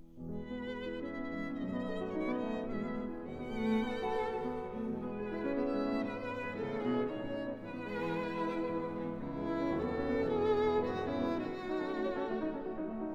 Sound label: Trio for Piano, Violin and Cello in A minor; Deutsche Grammophon